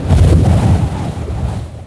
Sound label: attack helicopter close flyby; hl2